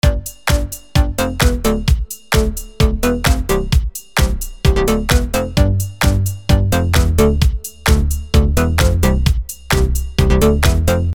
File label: clubstar